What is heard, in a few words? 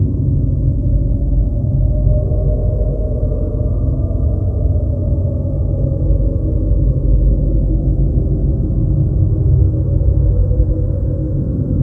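eerie ambience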